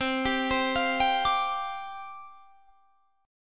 C Suspended 2nd Suspended 4th, down, Guitar, Standard; Csus2sus4 arpeggiated; JGuitar